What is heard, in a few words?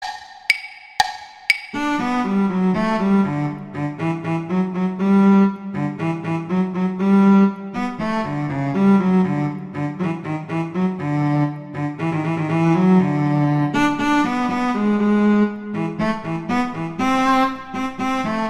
Cello; Duet 1; Cello II; Mozart; Duets